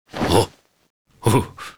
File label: red panda anim hunker; netent